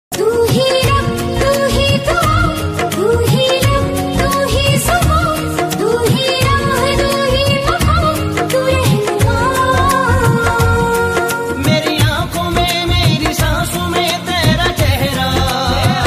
New Hindi Ringtone; ringtones

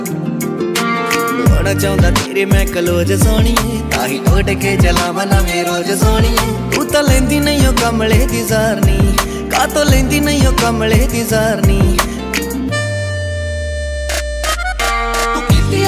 Song Ringtone

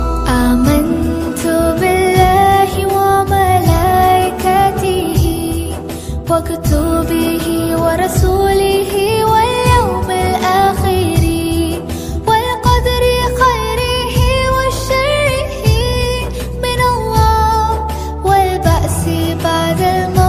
Arabic Naat Ringtone

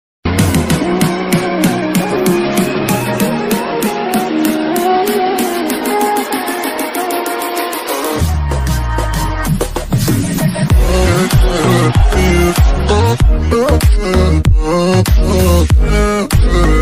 Attitude Background Bgm Ringtone; ringtones

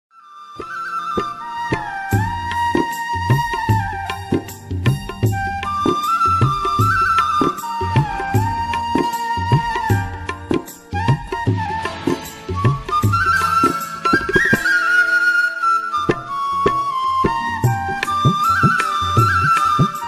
Flute Ringtone; Hero Movie

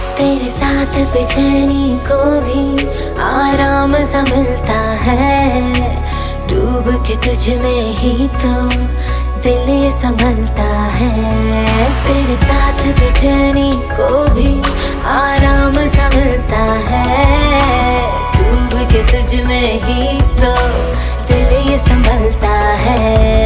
Romantic Female Ringtone

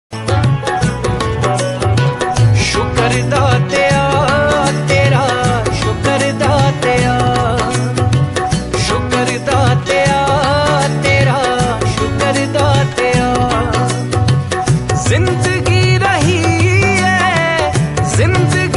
Punjabi Shabad Waheguru Ringtone

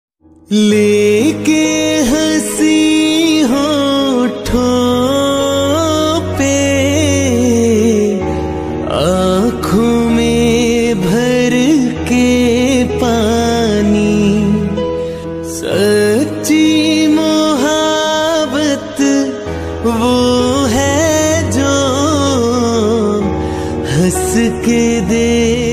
Old Hindi Song Ringtone

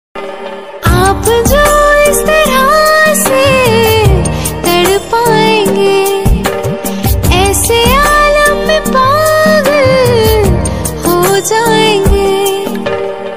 Female Version Ringtone

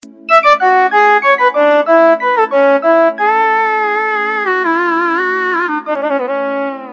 Original Nokia Ringtone; ringtones

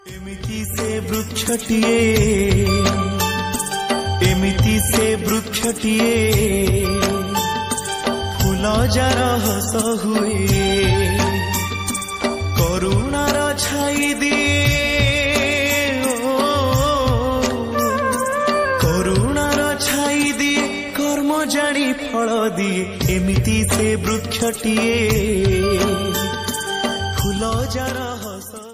Bhajan Ringtone

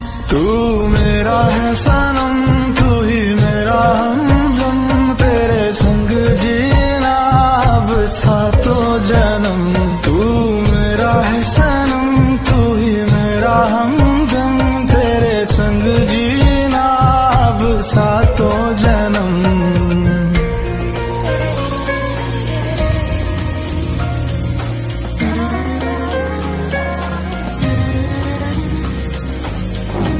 Love Song Ringtone

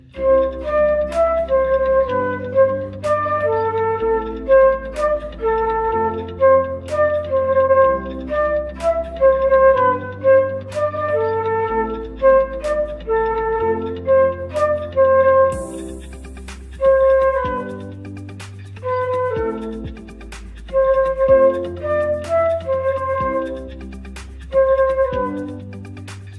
Flute Ringtone

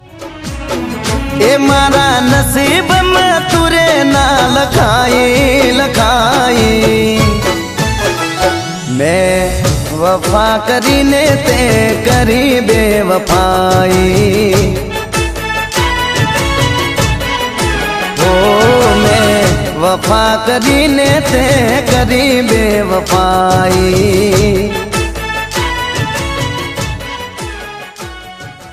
Gujarati Song Ringtone

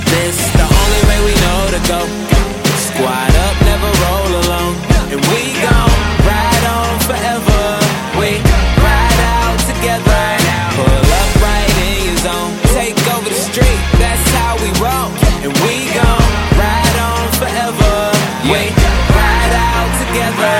Hollywood Ringtone

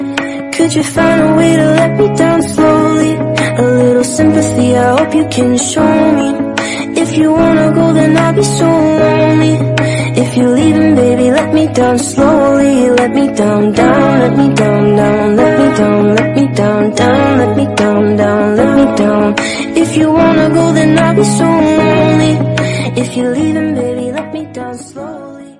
Female Version English Ringtone

